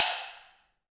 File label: Shell Dance; Roland LCDP14 Africa VOL-2